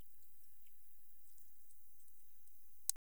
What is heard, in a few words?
Pipistrellus pygmaeus; Sant Vittoria Alba